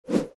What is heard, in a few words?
Twirl